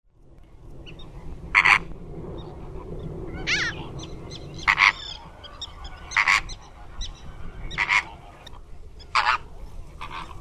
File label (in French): flamant rose